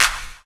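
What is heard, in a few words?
clap hip hop, drum kit; DRMKIT; E-Lab; X Static Goldmine 2; akai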